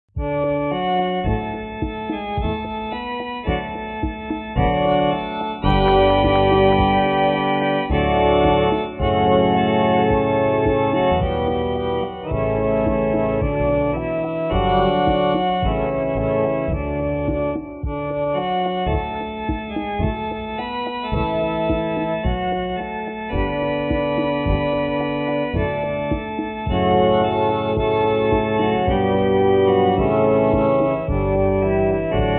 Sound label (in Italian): solo musica